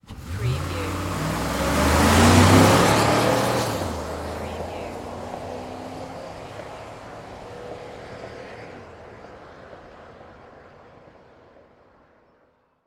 Auto Drive Away Fast; sound effects bible

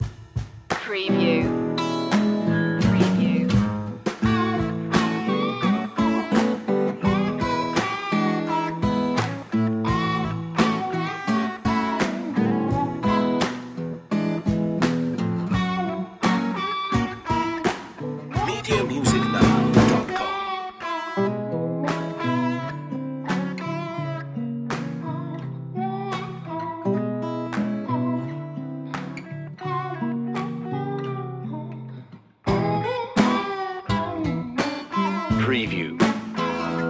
fish fry blues

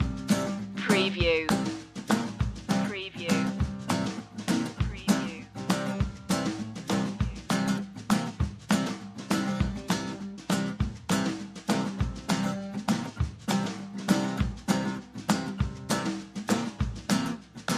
stomp loop

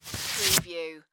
Vinyl Record Remove; sound effects bible

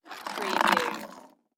Object Slide Table

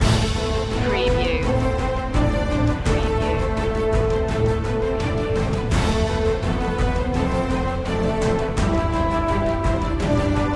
celtic heart no choir

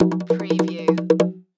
Musical

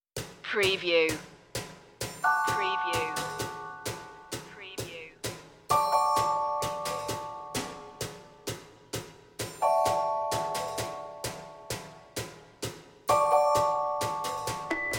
no strings woodwinds

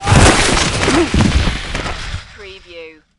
Skiing, Snowboarding, Fall with thump, vocal umph

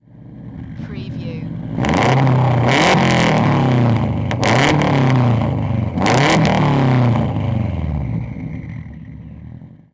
SUBARU STI EXHAUST MULTIPLE REVS

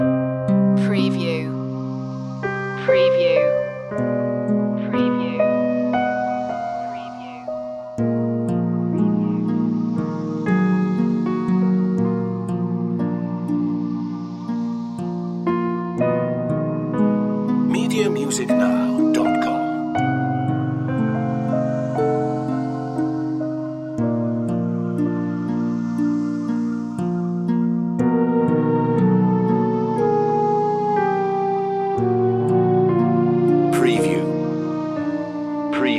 sad and black loop

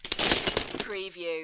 Lego, several pieces drop on floor

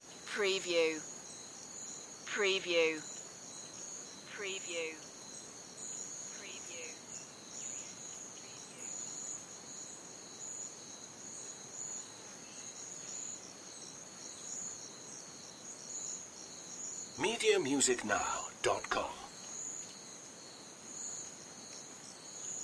rainforest ambience

